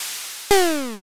sneeze

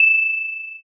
handbell

